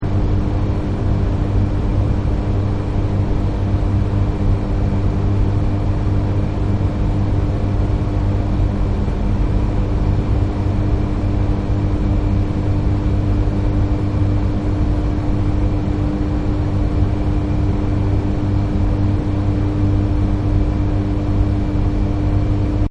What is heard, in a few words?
Room Tone Machine Room; Backgrounds